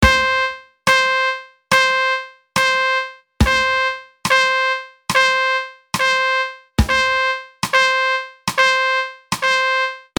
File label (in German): Toene schrittweise versetzt